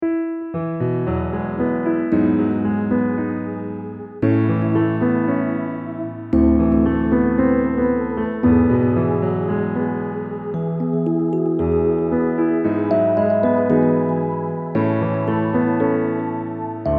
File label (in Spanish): piano ambiental